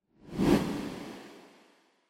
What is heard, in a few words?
Camera Motion Mid Effect; シーン・カメラモーション